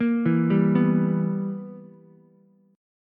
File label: B Major 7th Suspended 4th Sharp 5th Ukulele, chord; BM7sus4#5; arpeggiated